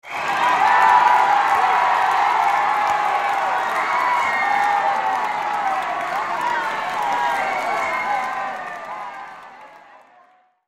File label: crowd cheering